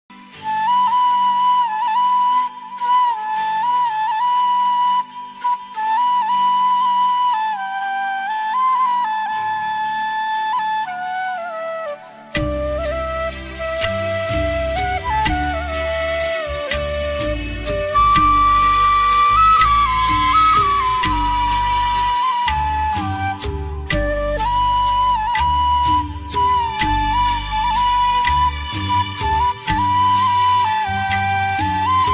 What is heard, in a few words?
flute ringtone flute music bansuri dhun flute ringtone